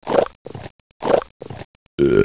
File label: EAT